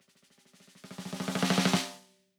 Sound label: Snare roll Edge